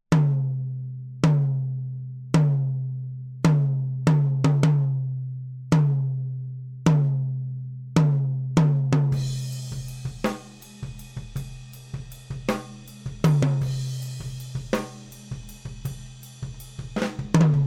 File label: Tom Microphone Oceanus